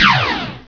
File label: gun; asciisec